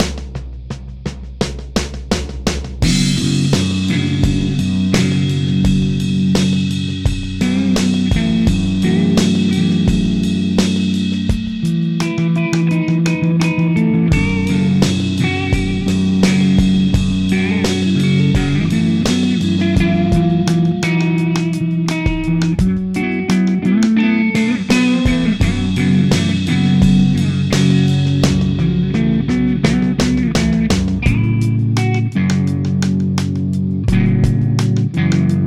Humbucker Steg; Beetronics Fatbee

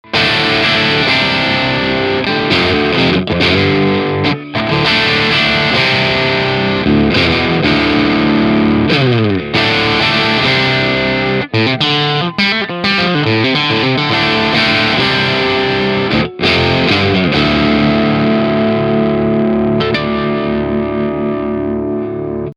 Maybach Teleman T61 Steg crunchy

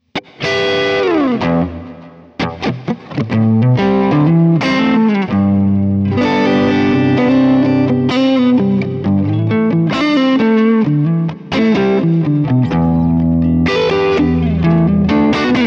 Floorboards im Vergleich Crunch Kemper

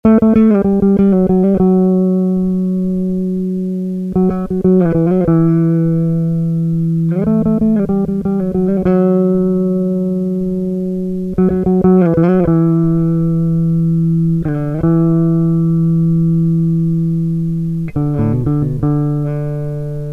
Sterling Ray35 hinten flat

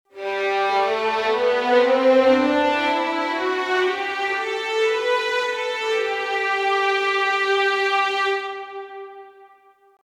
Violinen G dur nur mit 1 Position

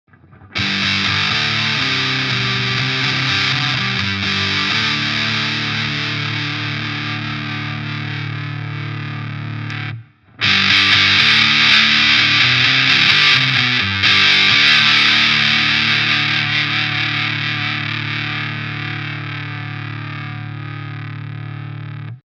Electro-Harmonix Sovtek Big Muff Pi Fuzz sustain full, später mid boost on

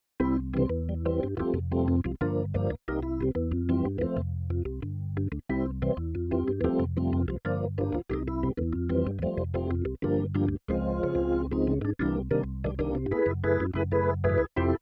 EVB3 ohne Hall

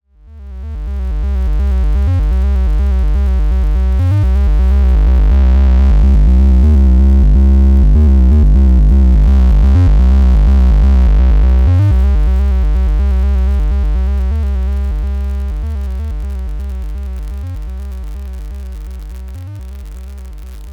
Xaoc Devices Leibniz Binary Subsystem Input Overdrive und Bit Crush

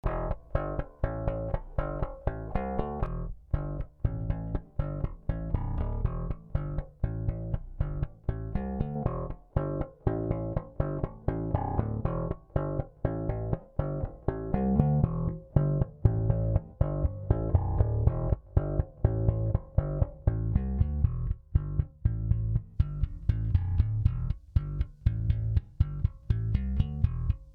enhancer und eq

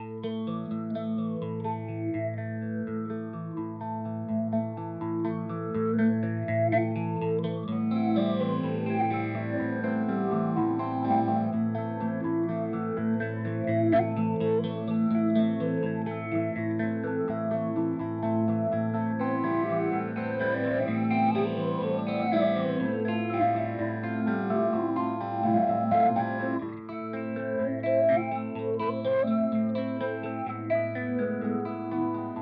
Lethargy Gitarre; Dreadbox

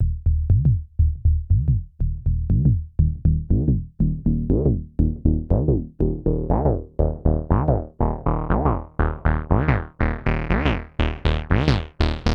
kleine filterfahrt

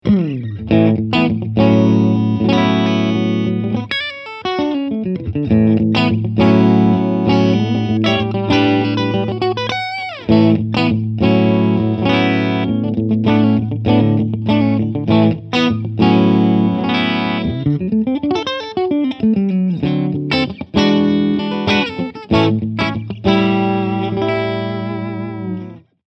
10 Watt Modus; Mesa Boogie California Tweed

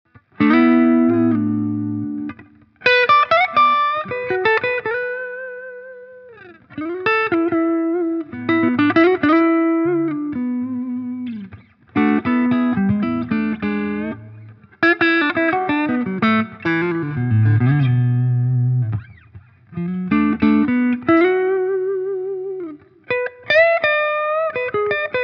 Gibson Les Paul Special Clean Hals

